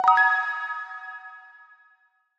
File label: Notification Pop Up